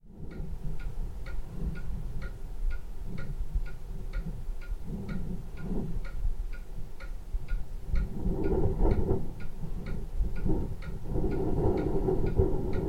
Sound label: wind in the chimney while clock is ticking in a living room